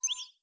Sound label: Gacha Transition; blue archive